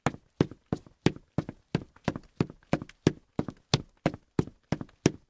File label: run; The Great Machine; OLCCodeJam2020